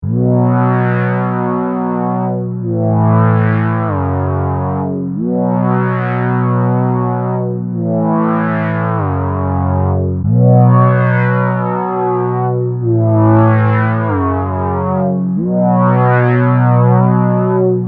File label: pad